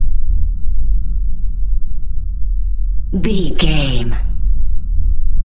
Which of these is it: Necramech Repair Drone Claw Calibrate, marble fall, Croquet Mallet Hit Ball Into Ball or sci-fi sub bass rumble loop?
sci-fi sub bass rumble loop